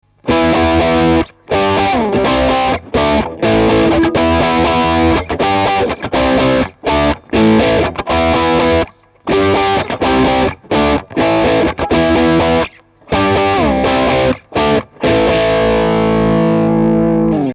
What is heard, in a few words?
Strat Am Pro hard puente medio